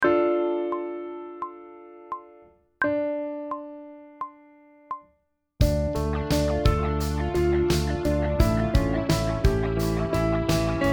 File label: Sight Singing backing track, pop vocals; Grade 3